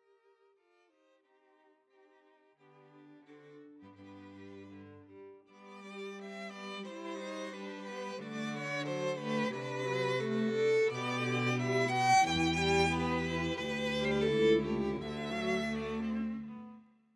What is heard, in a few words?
bridal chorus; wagner